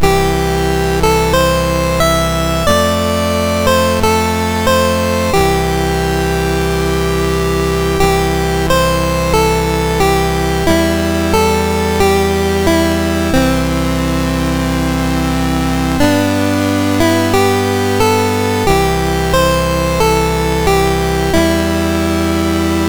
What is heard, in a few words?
Tibet, National Anthems, MOS 6581; MOS6581R2